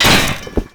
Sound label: Umbrella Stand Destroy